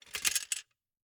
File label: Gumball machine, metal, glass, candy, switch, mechanism, rotate, dropping, hyper; Eiravaein Works; Vaeyan IV